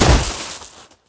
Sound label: rocks hard land; Original TK Sounds